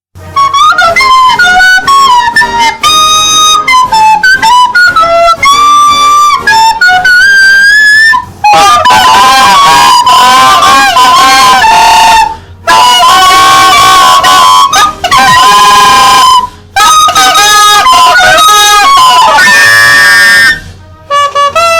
la marseillaise france anthem shitty fluted

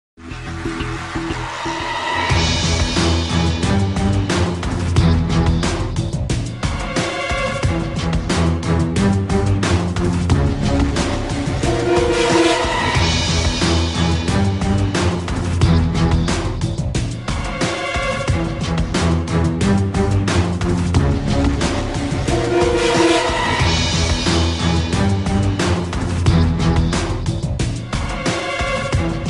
Theme; Shark Tank

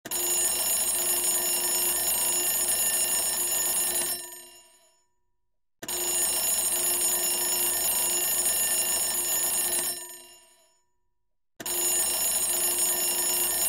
clock alarm sound effects

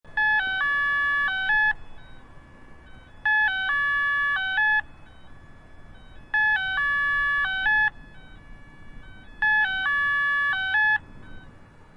pedestrian crossing

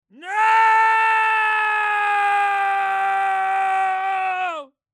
Male Death Crying Nooooo Scream

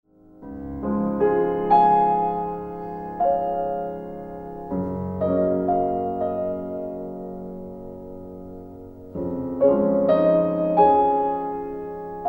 piano

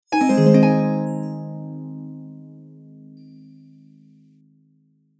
sfx magic

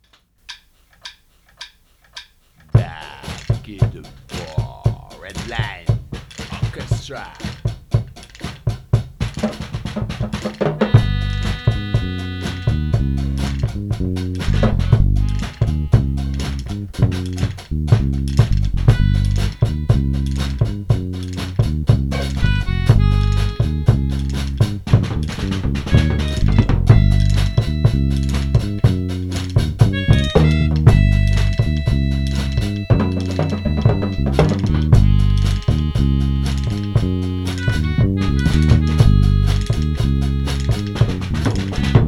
INSTRUMENTAL, ORCHESTRA; REDLINE

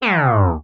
cartoon wrong answer fail descending tone

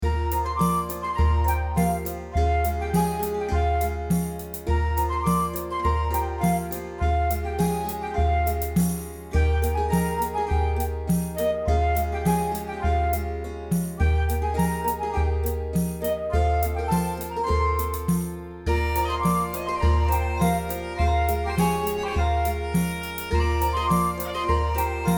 Forest Frolic Loop

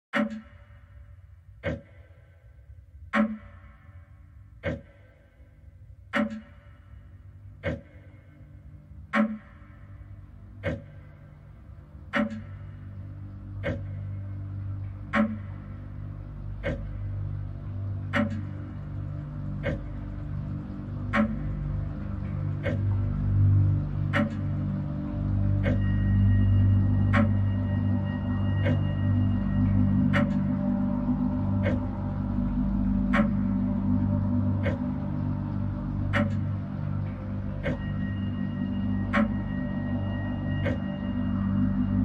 ambiance

